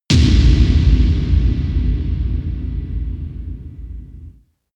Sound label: fire impact; hits explosionen